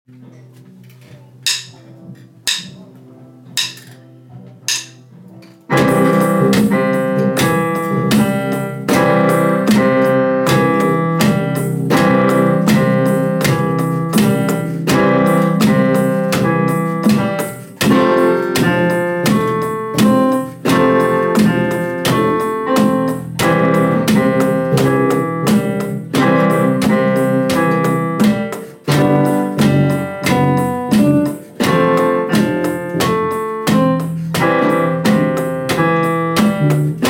12 bar blues without vocals

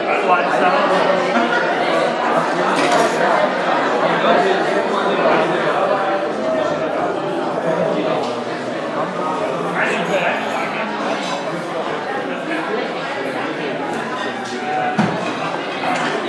cafe, Hamburg

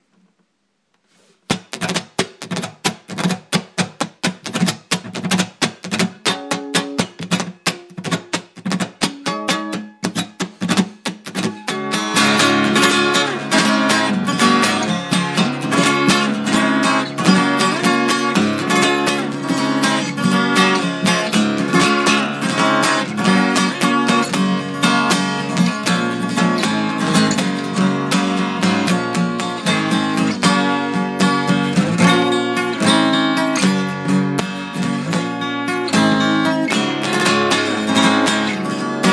guitar thing in DADGAD with bad timing

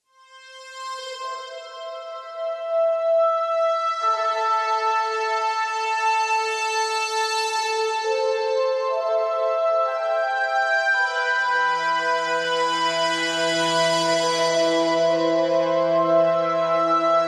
ms20 test pad sounds

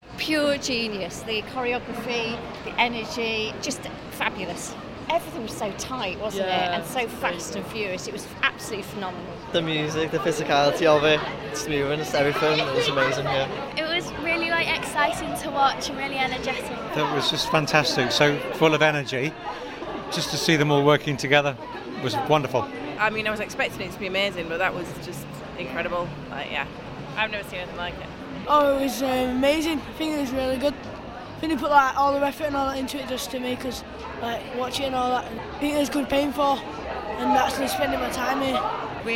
audience reactions

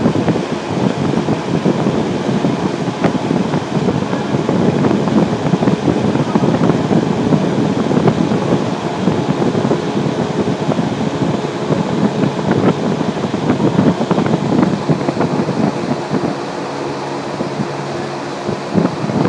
charing cross fan

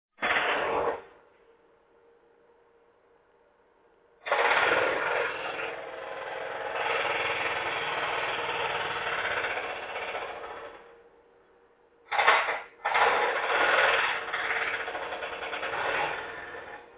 jack hammers; at 2300hrs; balfour

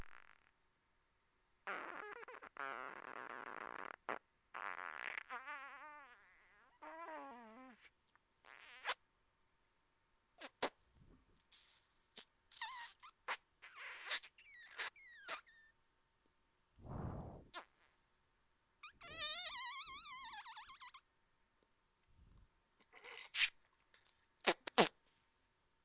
solo vocal improvisation

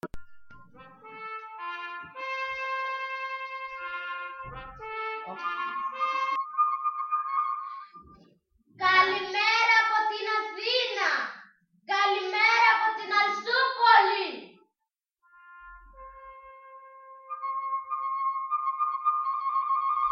Jingle Bells in Greek

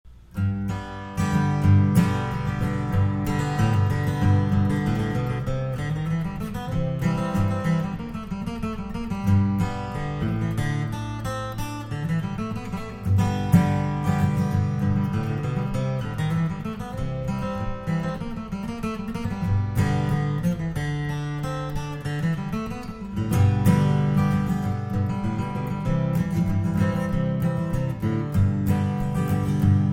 pickin

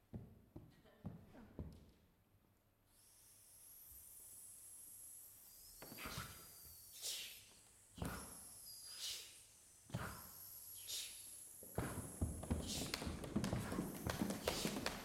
rhythm rhymes railways soundscape

